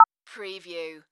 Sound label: Multimedia Beep